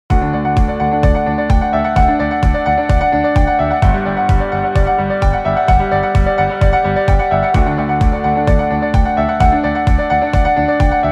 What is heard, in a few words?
corporate process